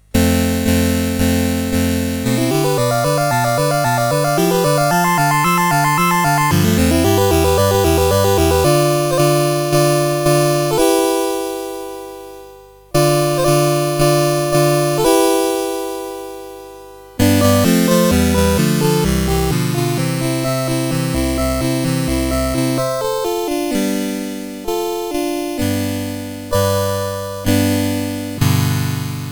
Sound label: Aria in F Flat Minor, CSG8580R5